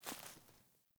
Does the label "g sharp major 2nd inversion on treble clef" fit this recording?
No